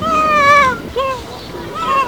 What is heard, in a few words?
ringtailied lemur